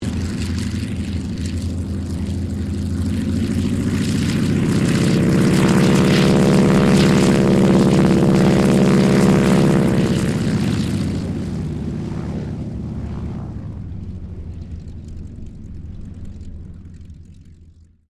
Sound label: AIRCRAFT CAC WIRRAWAY PREFLIGHT; CA-16 Wirraway; Airplanes; Vehicles